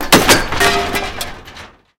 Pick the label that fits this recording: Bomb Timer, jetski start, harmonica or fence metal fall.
fence metal fall